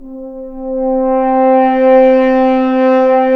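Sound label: french horns, swells; Roland L-CDX-03 Disk 2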